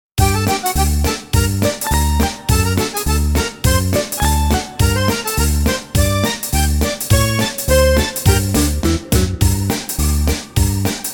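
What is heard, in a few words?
minus G minor alto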